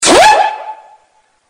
steam siren

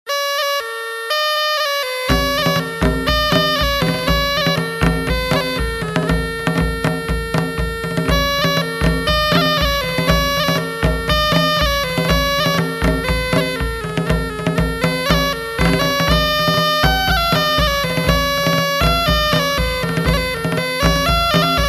Irish Folk

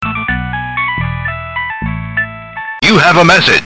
Nokia Massage

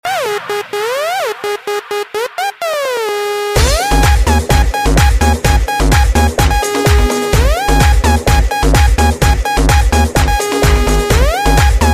Techno

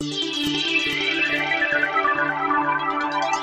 Cosmic Ringtone

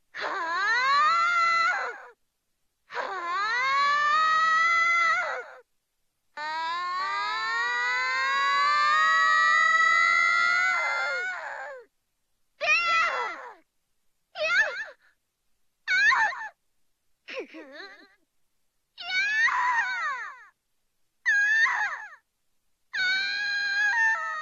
screaming

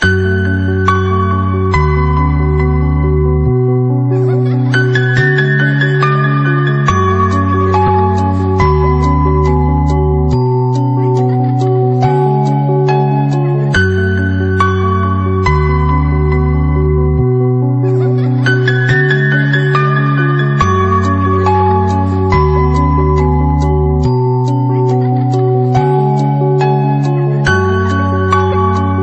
Nokia Tune

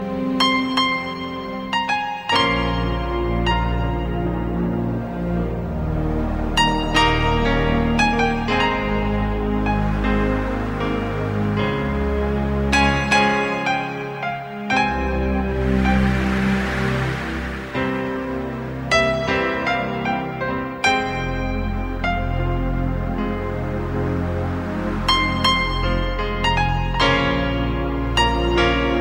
Piano Music

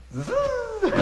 Zzzzzzzz

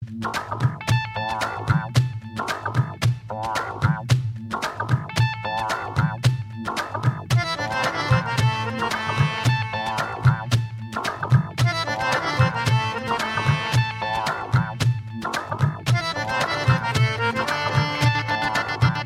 Desi Msg Tune

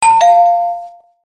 Ding D**g - Doorbell

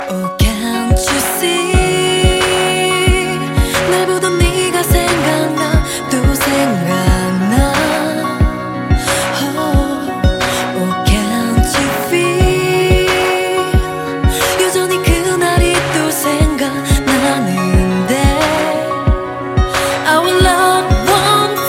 Chorus

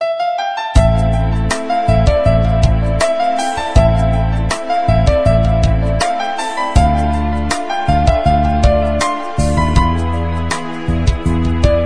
Piano Ringtone

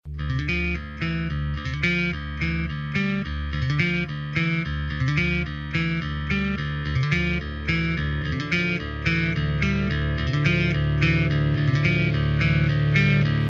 Bass

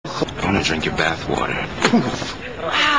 Bath Water

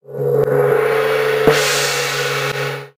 Asia Gong Funny

